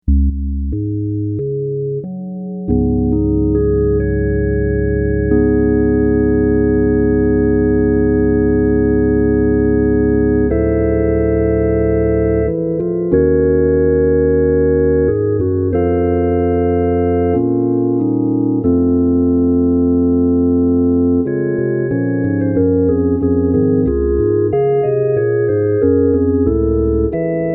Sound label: Organ Acc